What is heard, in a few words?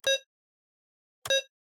Environment keypad